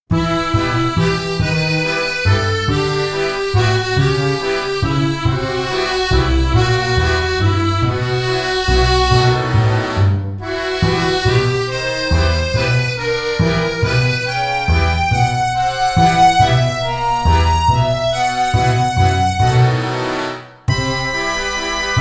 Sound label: waltz; entotsu